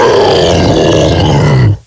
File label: Pound Death